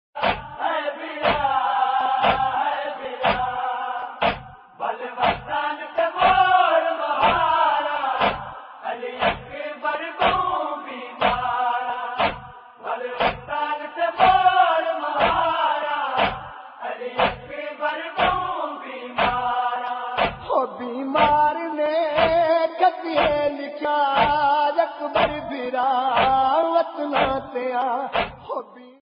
noha ringtone

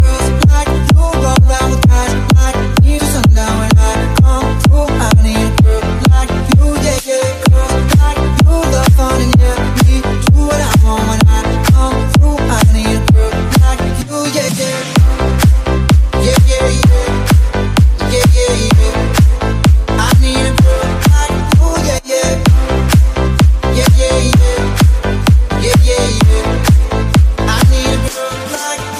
english remix ringtone; english ringtones

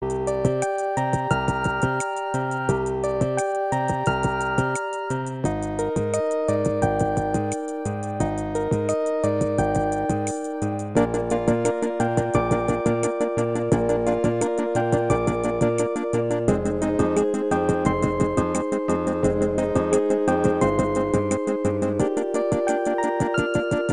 motorola one power ringtone

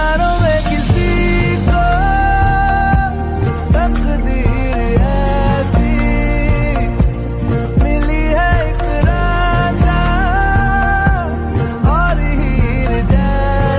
bollywood ringtone

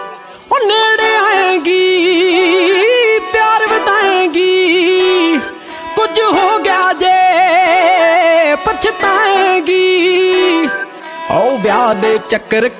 punjabi ringtone

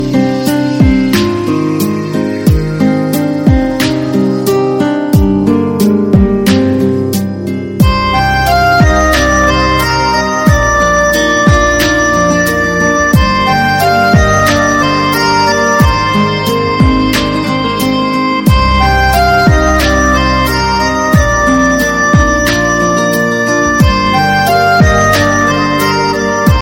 instrumental bollywood ringtone

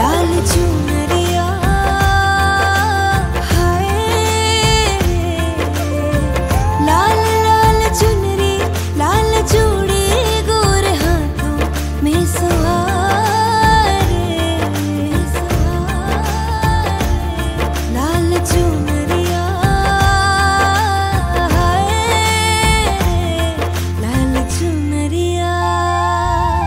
hindi ringtone

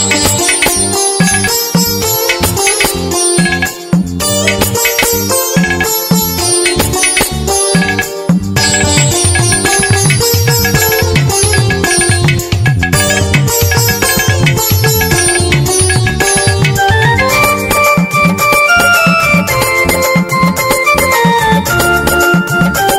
lord ganesh powerful ringtone

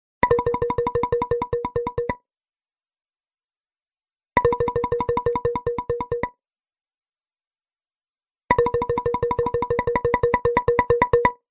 pluck ringtone; galaxy s4

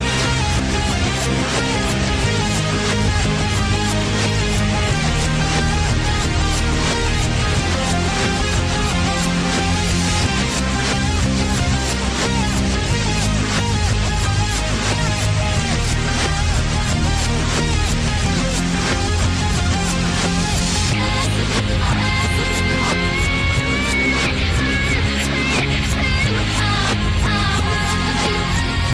sad ringtone

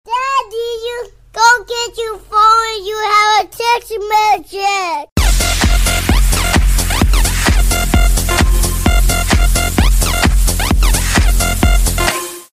you have a message ringtone

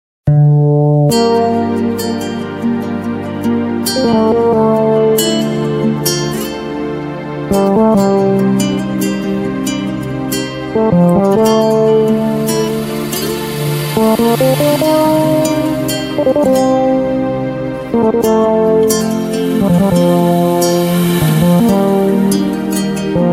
ringtone best ringtone

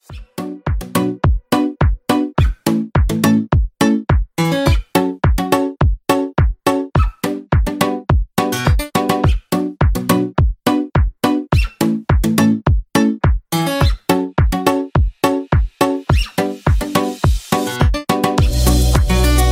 ringtone, samsung

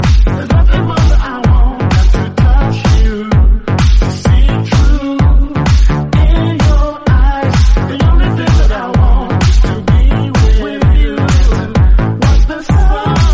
rocking english song tone ringtone

